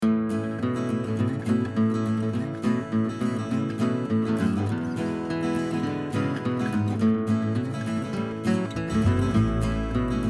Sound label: Swamp Stomp